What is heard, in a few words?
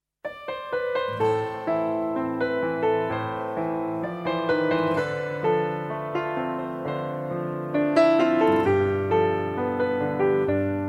slow piano ringtone